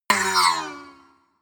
spring

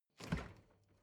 refrigerator door open hard

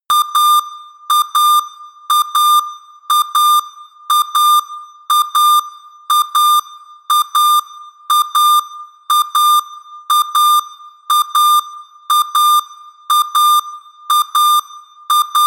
notification warning

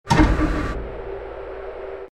hydraulic blast

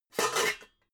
pots clank

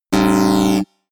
sci fi alarm red flash short

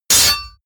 sword hit metal

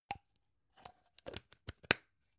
gum plastic box close long

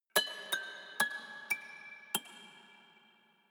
haunted strings tinkle sequence slow short

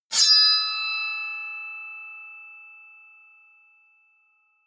sword wield ringing

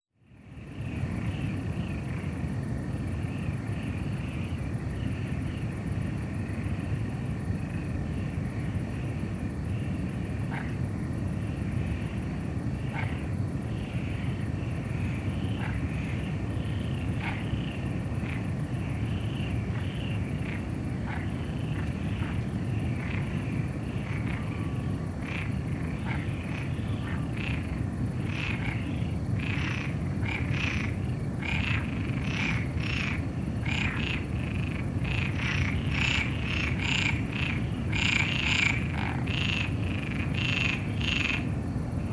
crickets and frogs

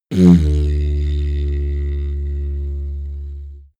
didgeridoo short heavy

